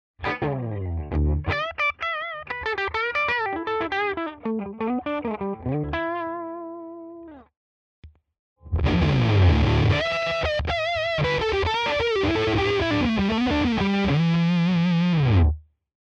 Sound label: FUZZ Lead Lick; Filter Flat 12 Gain 12